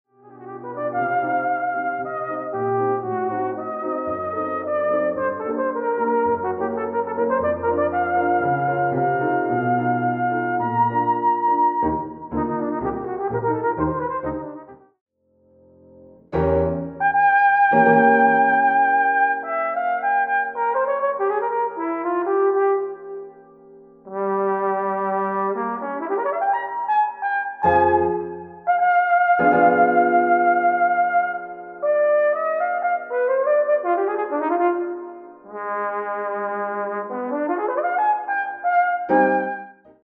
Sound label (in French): Prelude de Concert